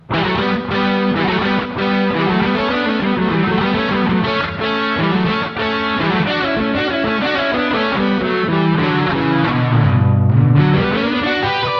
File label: Intelligent Harmony 3rd above